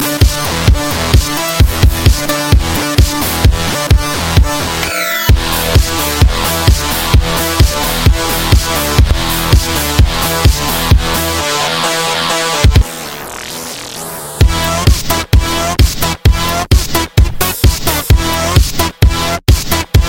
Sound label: Remix Techno